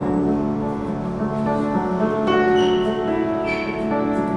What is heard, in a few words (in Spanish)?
Loops mutantes piano cama